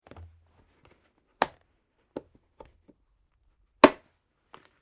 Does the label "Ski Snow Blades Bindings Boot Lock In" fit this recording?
Yes